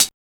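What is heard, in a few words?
hi-hat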